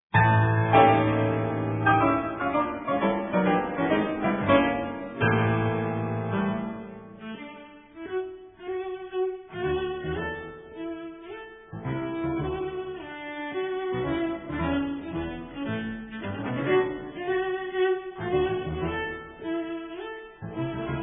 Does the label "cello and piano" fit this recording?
Yes